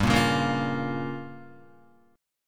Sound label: G-Minor 7th Flat 5th, Guitar, Gm7b5 chord; strummed; JGuitar